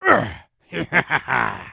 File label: Shoot Complete Oneshot; creatures organic